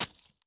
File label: Hive Zombie Give Birth